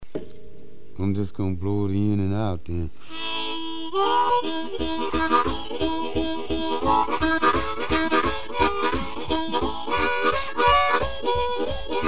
unnamed harmonica tune, blues lower chattahoochee valley